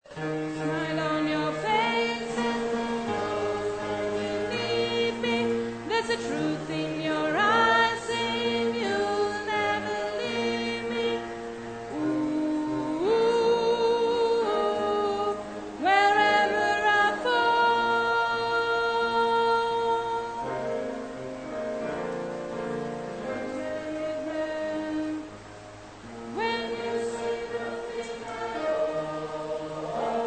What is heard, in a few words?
bas